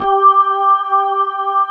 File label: B3 HAM, Keyboards Old School